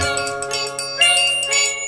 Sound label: LG Notification Ringtone